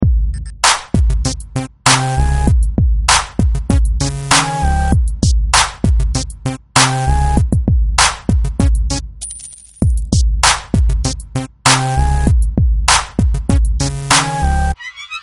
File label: Crunk; MegaFon